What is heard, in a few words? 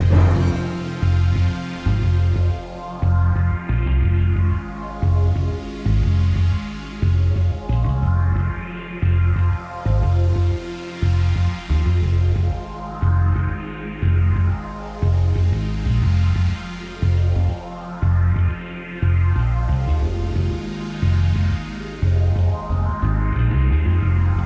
Synths Gtr; Headlander